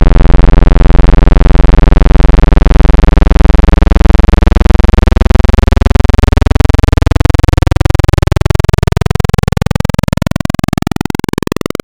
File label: Analog PWM Custom